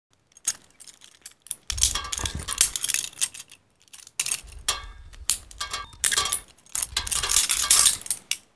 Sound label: shackle; Mafie 1